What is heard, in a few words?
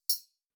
Junk Hi Hat